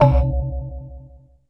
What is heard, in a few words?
BONK HIT, HAMMER PERC; Zero-G Ambient 1